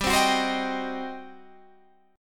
G Major 7th Suspended 4th Sharp 5th, G, down, Guitar, Standard; GM7sus4#5 strummed